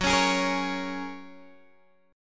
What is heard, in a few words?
F sharp Major Add 9th, Guitar Open G